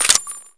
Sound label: bullets out